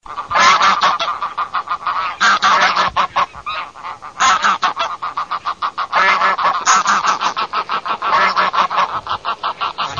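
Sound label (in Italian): OCA SELVATICA GRUPPO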